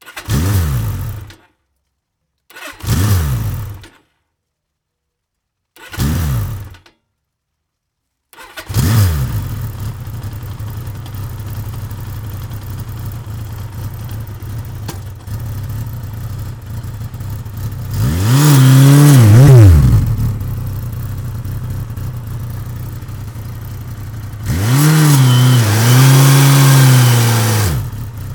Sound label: Suzuki Gsx 1100e